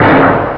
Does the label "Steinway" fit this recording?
No